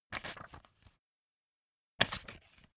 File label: magazine flip forward